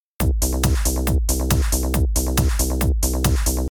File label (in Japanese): ベース